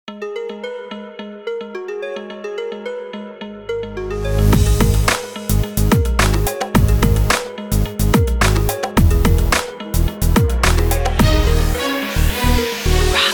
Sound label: Classic Remix, ringtone